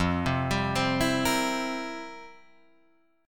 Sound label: F Major 7th Suspended 2nd Suspended 4th, F, down, Guitar, Drop D